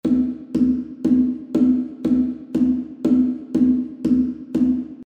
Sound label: Conga Set Room